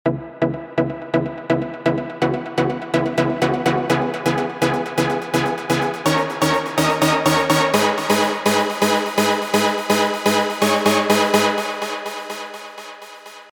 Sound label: lead; red woods